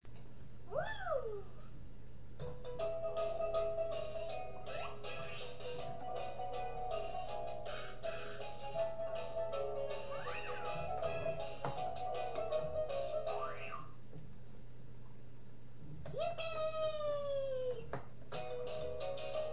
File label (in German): Rolli die singende Schnecke